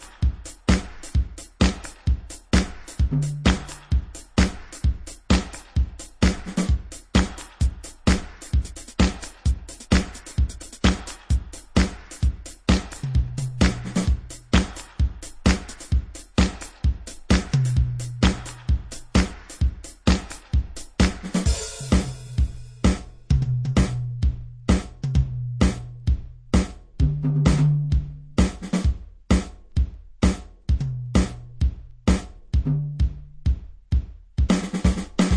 drum

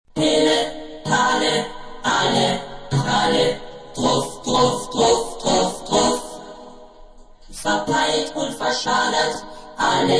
acapella

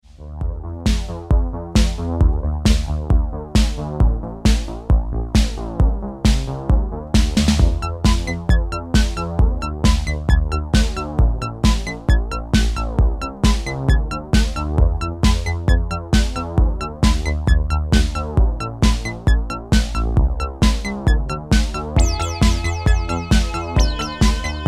Instrumental; Disco

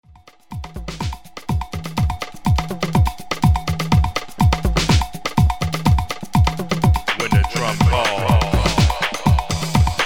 drum break down mix

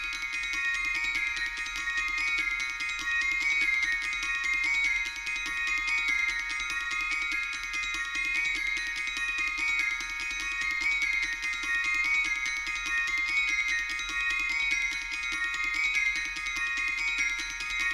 Drumming (part III); Deutsche Grammophon

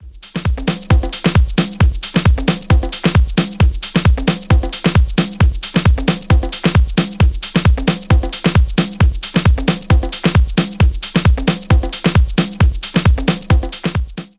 Drum [Locked Groove]

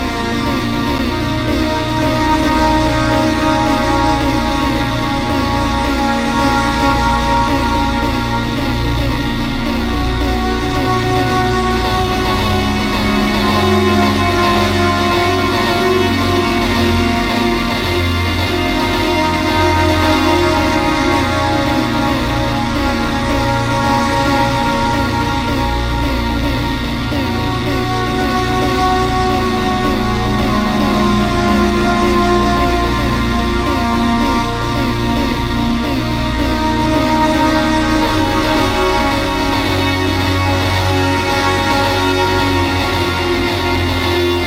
Flute Loop

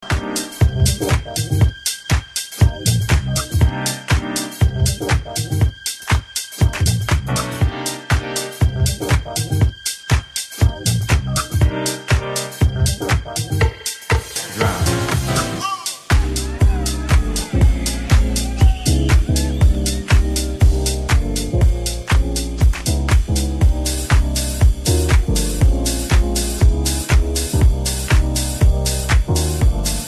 drums

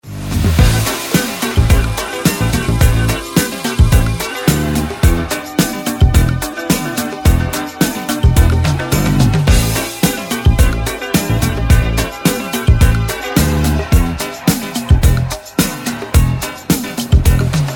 Remix Instrumental

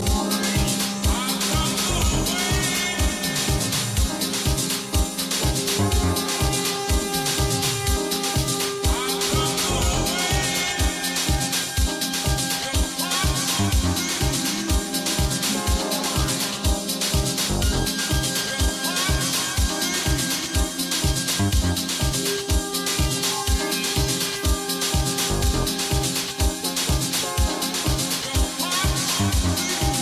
Broken Drums

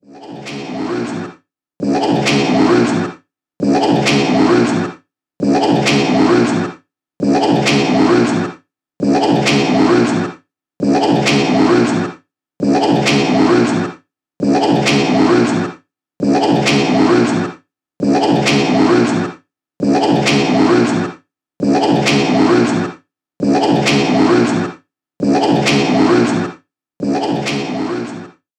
LOCK GROOVE

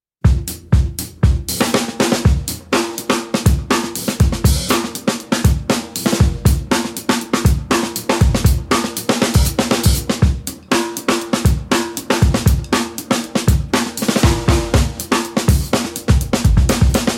120 BPM Whirlwind Break

